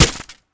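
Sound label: arrow impact; smite; ahpuch skin10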